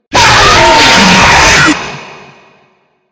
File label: jumpscare sound; one night at ncs